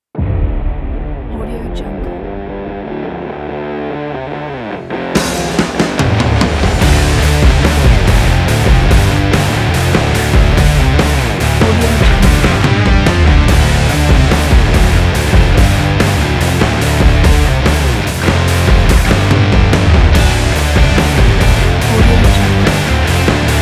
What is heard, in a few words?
dirty garage drive rock